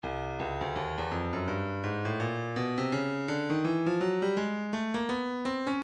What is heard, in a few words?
breakbeat 083 bpm; Oppressor C; Stylus Breakbeat Midi Files